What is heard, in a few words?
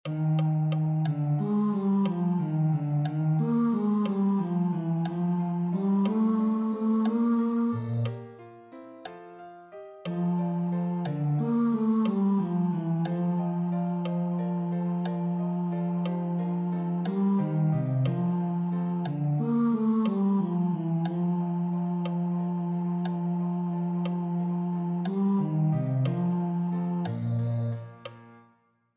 benedictus osanna basses; haydn